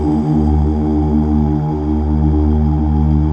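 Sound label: Male Uuh; Best Service Gigapack 1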